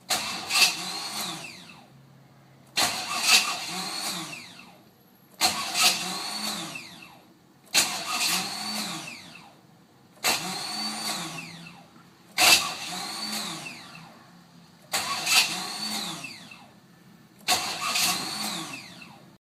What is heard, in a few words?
bendix spin